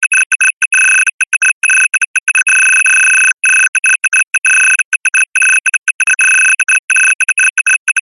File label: science fiction code incoming beeps